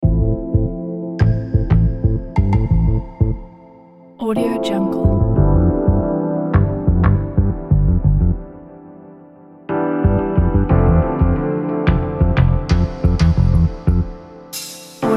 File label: lounge jazz